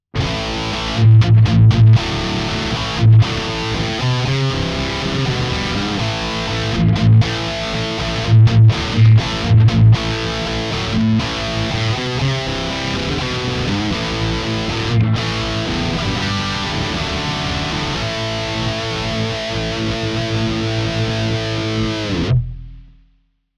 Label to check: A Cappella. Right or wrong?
Wrong